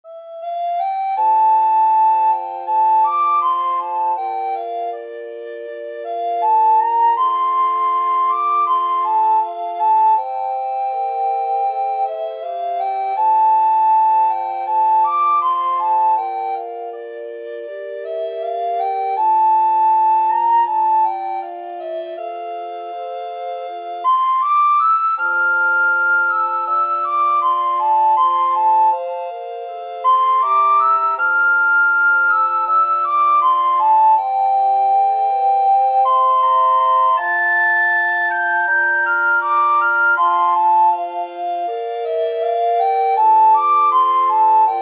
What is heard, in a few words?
danny boy, recorder quartet